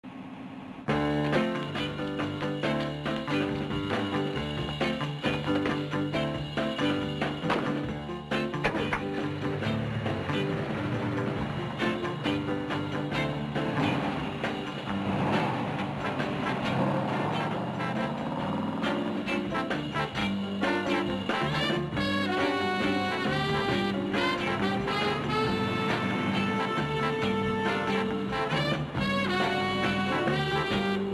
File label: music